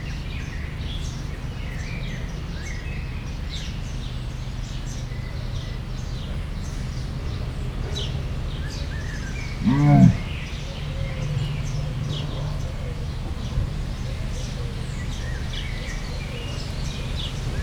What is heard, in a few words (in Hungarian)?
nagyon halkan jelez gépzaj, leopárd; jaszberenyi zoo 2015